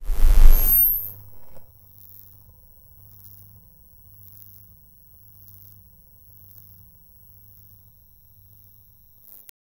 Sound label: leak hissing; wrist tool; energy wave